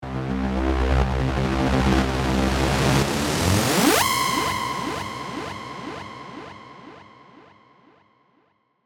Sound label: riser end up swell